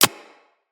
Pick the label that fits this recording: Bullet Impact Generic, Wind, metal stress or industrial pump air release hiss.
Bullet Impact Generic